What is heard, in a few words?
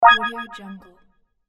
time out